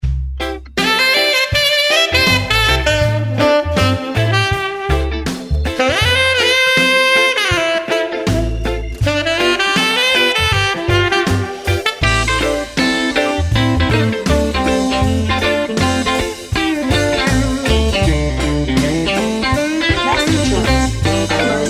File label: reggae 160bpm; MASTERTRACKS